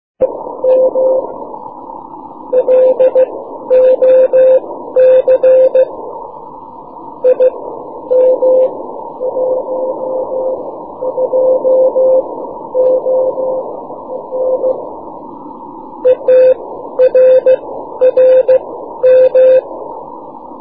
6m beacon

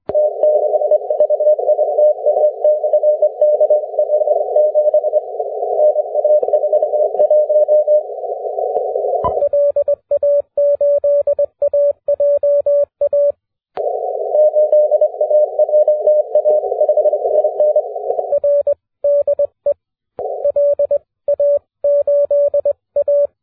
40m cw

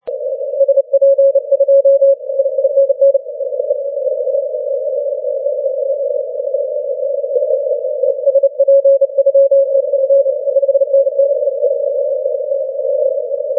40m cw

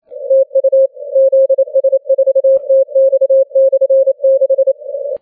6m cw

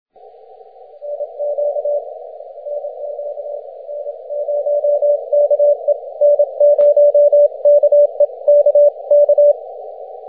6m cw

